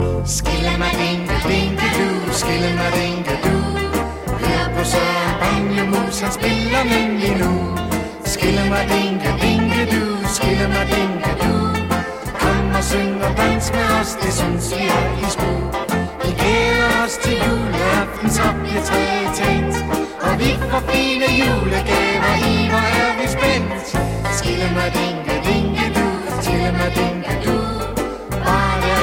banjo mice; ringtones; holiday

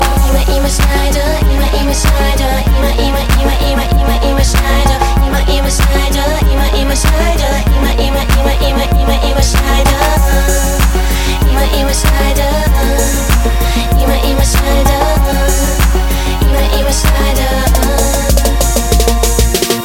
emo, ringtones

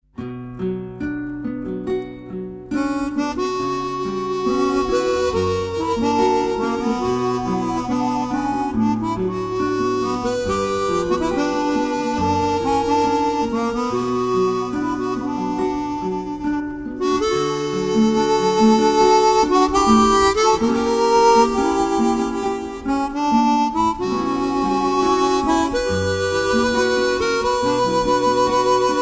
simple music, ringtone

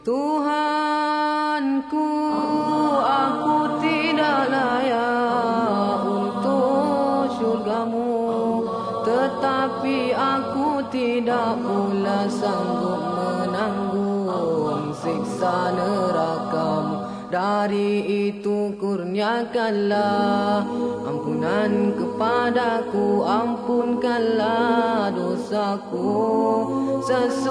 zikir taubat

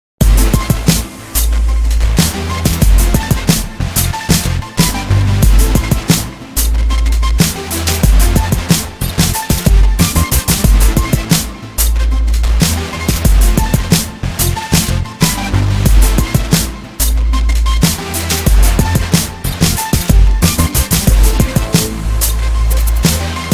synth hop; hip hop

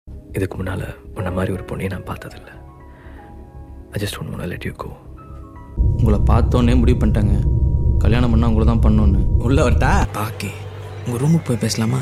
nice tone

